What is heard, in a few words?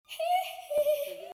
heeeeeeeeeeehee